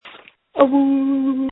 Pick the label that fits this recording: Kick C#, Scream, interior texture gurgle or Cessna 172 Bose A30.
Scream